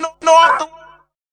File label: lop 126bpm, House Instr and Stab Loops